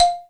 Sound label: BLOCK, LATIN PERC; Greytsounds VOL-1